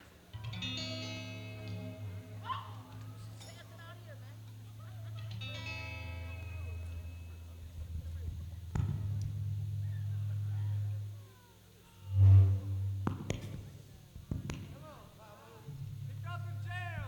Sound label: Tuning, Live